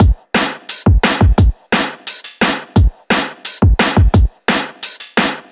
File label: DRMLP, Drum Loops; neuro; Stanza